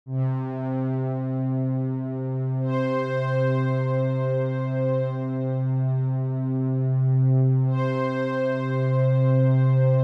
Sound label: String